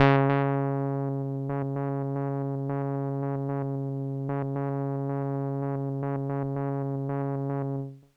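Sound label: Kawaii C#4; Randroid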